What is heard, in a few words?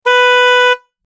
Car horn honk